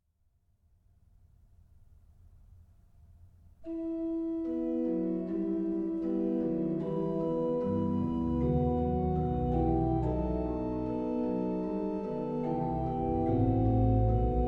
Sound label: In dich hab ich gehoffet, Herr, BACH ORGAN, Chorale preludes; Orgelbüchlein